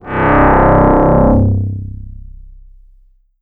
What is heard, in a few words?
Yamaha TX81Z Double Bass C1